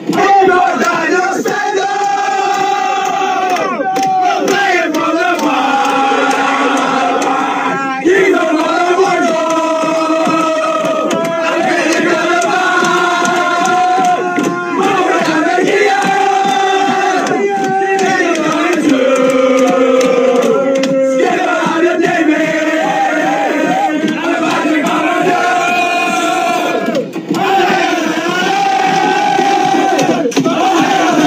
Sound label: allez allez allez, fanchants